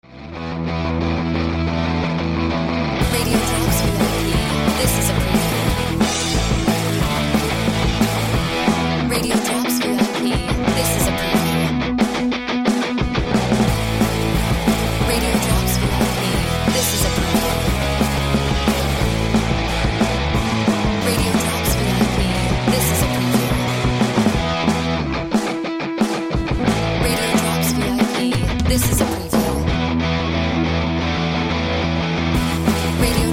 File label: Music Bed